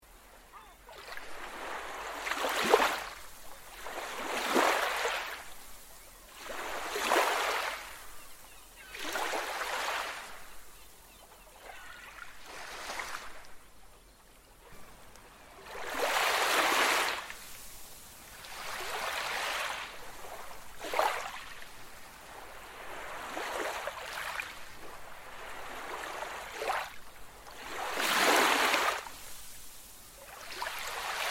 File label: ocean scene; 海浪; 潮汐白噪音